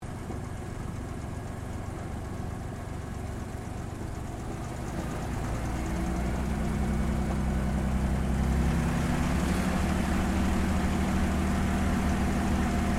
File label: AIRCRAFT CAC CA-18 MUSTANG MK23 TAKEOFF INTERIOR STEREO, WW2 Mustang fighter plane